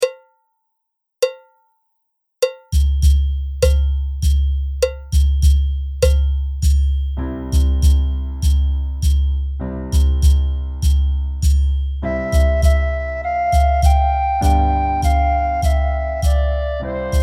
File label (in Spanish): himno de la alegria con percusion